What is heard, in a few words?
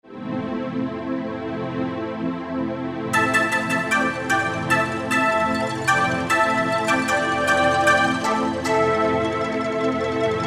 Japan, Yamaha TG500